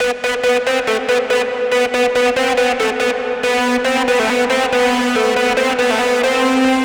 Melody Loop Emin 140BPM, dubstep; Glitter; Ghosthack; Dubstep Sounds 2025

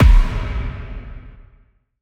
Impact, Hits, Impacts; Cymatics

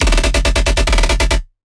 Dirty Bass D# 140; One-Shots; Bass Hits (Dirty); Ghosthack - Color Bass Essentials

